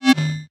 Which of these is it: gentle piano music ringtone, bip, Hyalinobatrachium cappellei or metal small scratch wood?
bip